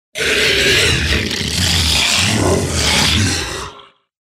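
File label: orc growls displeased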